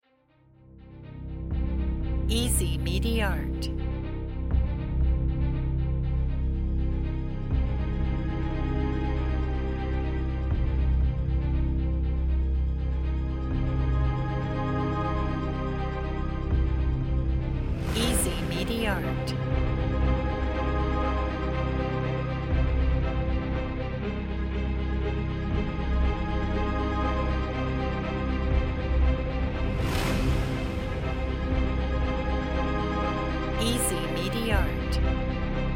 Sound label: dramatic epic trailer